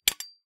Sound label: sword; Kenney's Sound Pack